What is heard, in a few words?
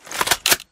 reload; RAIDER GameJam; player pickup